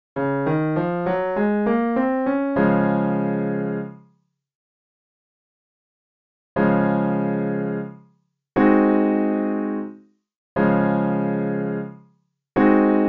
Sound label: I, V7, progressions; easy